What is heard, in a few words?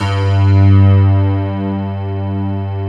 piano pad G2